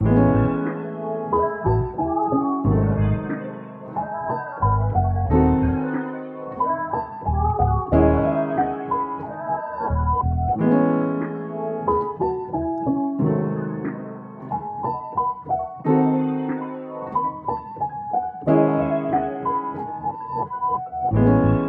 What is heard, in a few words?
182 BPM C# Min, Melody Loops - RnB; Don Julio